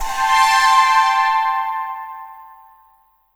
PAD -L, Drum & Bass, 160 BPM; Ueberschall; Drum & Bass Resonance

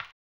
HAMBONE, Vocal Blues Soul; Spectrasonics; Planet

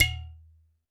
percussion, Roland TD-20

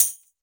Tambourine, Percussion; Roland BK-5; Tambourines

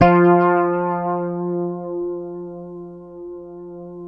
Fingered bass F3 L; Sampleheads; Will Lee Bass Library

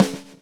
GRV, Groove; AMG Gota Yashiki Groove Activator

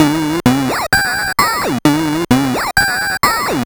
Sh101 Loop, 130BPM; musicradar; bitcrushed; Crushed Loops

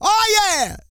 REGGAE, Vocal Planet, World Voices

